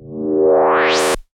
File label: Monotron Short